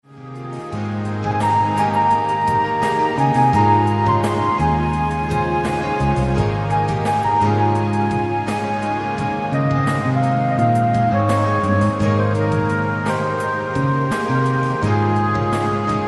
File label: Dancing Flute